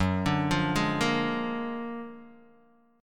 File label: Gb Augmented Major 9th Guitar DADGAD